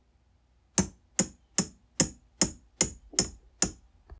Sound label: Toolbag brass clink hard